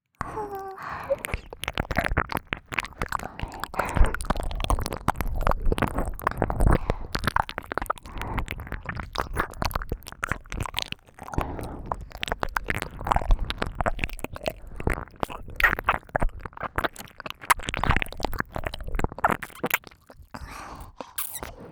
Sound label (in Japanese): 両耳舐め, ASMR; 年下幼なじみ×ロリメイド